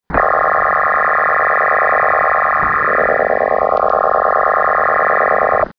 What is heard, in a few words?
selscan, digital modes